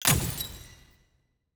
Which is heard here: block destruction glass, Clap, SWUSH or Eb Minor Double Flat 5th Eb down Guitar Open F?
block destruction glass